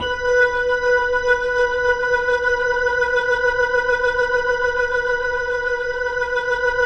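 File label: 3B L, Slow Fast Hammond, Organs; E-MU Producer Series Vol. 5 – 3-D Audio Collection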